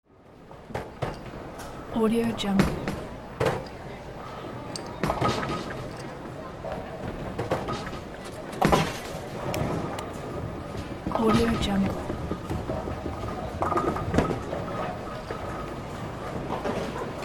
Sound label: bowling alley ambience; mattpear